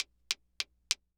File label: Impact Wood Blind Person Cane Multi Hit